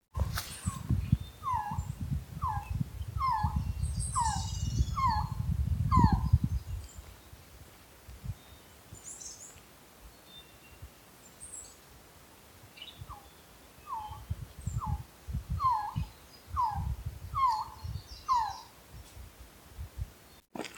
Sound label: Resplendent quetzal